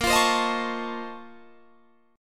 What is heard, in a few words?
A sharp Minor Major 11th, Guitar, Drop D